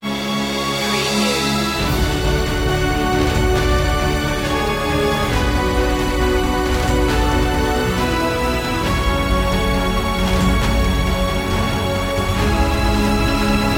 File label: no choir